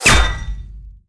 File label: gunshot hit bullet; Blind Drive